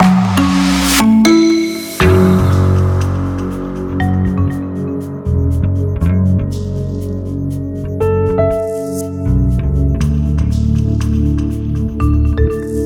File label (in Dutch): Logomuziek Bedje Rustig